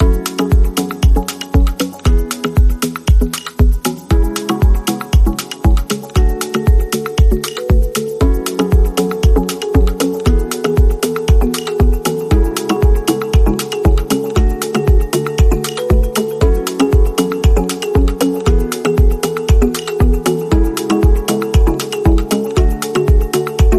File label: Percussive tranquility